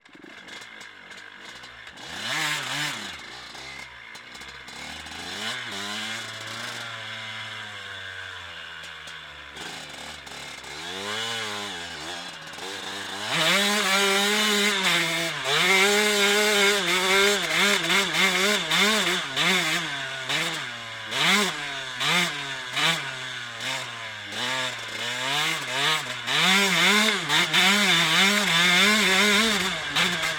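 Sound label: TM 125, Onboard, Start, Drive, Jumps, Stop, Off, Engine, Left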